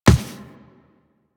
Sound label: Walk Default, Footsteps; Warframe; Operator